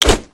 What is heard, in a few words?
brickmove; build mode